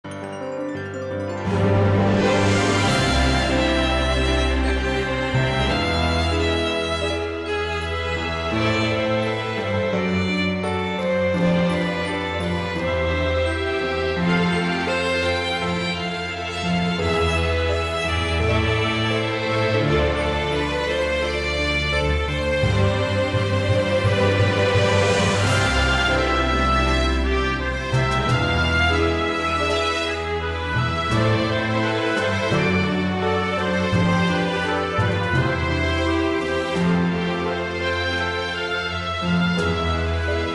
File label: wedding BGM Marriage